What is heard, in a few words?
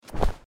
hit boat